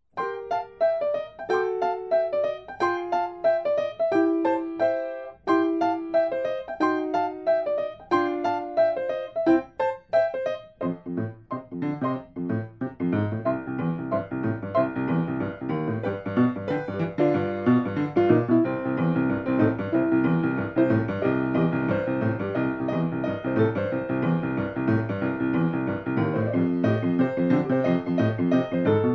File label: Boogie Woogie Piano; piano kurs